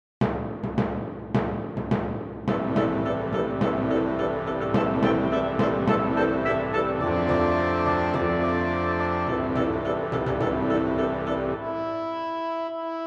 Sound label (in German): klingelton vierviertel